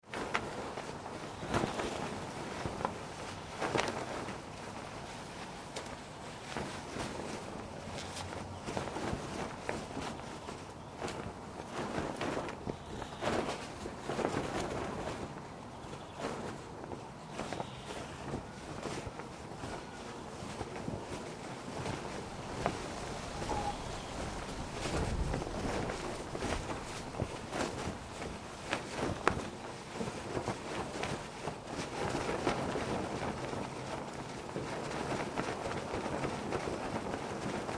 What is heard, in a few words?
Flag or Sail Flapping in Wind, Various flapping; Weather